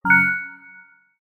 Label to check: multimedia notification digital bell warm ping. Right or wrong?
Right